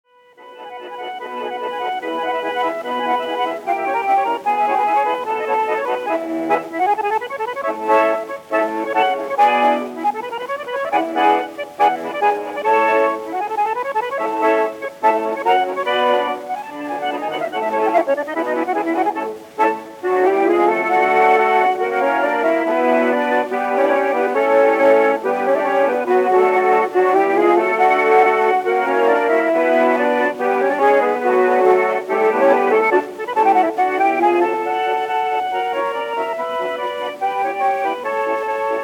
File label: Neapolitan Polka